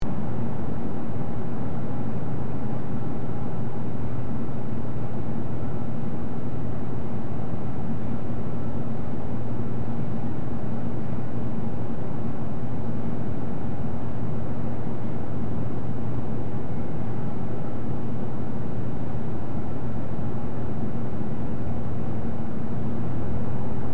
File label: Attic room tone ambience wind blowing